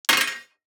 DICE on Metal, Throw and Roll, Standard, 2 Two Dice; Samples; ArticulatedSounds; DICE Sfx Party (Extended)